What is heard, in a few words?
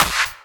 Claps & Snares; Mutekki Media; Vengeance Electroshock Vol.2